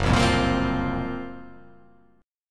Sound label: F sharp Major 7th Flat 5th